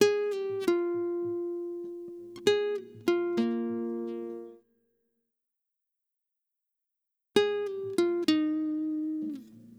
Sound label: Guitar, Acoustic